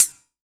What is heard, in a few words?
Yamaha CS1x Drums